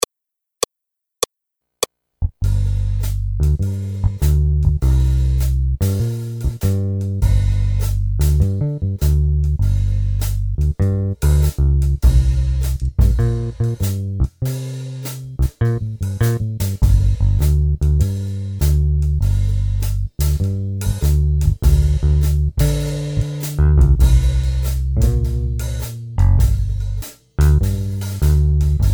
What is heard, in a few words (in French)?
Accompagnement seul